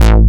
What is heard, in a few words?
Bass A, Offbeat Bass; VEC1; Club